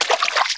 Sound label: player slosh; xash3d fwgs